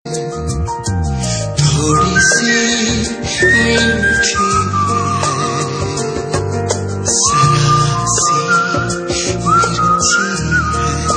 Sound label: Whistle Tone; Hrithik